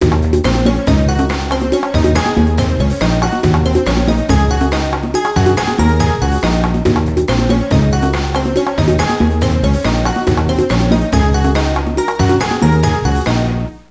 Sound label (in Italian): musica traditional est; fareast